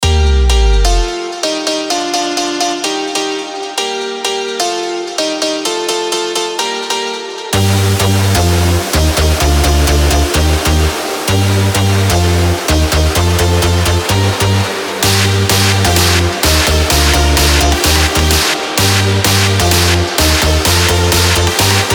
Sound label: BEATS EDM